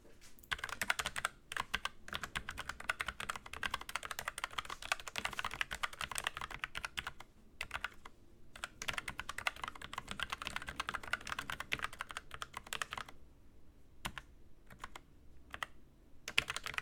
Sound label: Logitech Mechanical Mini soundtest